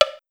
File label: Woodblock; eartraining